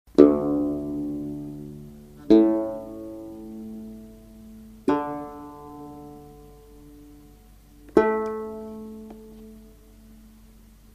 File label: palm frond